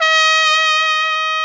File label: SOLI TRP, Alpine Volksmusik TROMPETEN; Best Service